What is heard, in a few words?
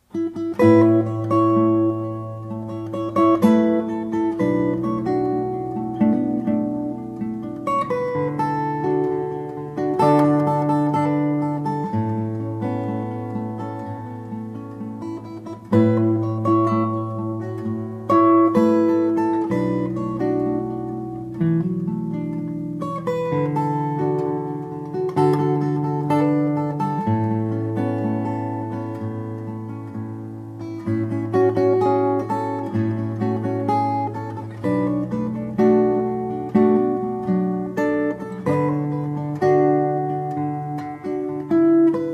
Cover Cello Piano